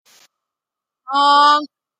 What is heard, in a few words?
honk